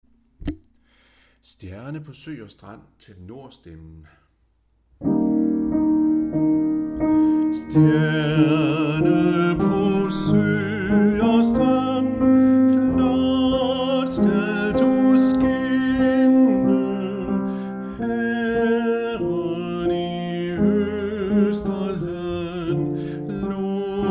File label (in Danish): tenor; Stjerne på sø og strand